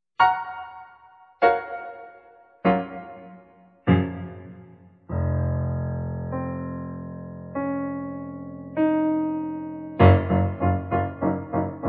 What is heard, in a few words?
Audigy Reverb